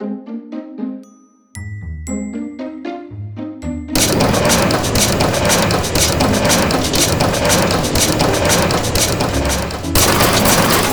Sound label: Fantastic Machines; SFXTools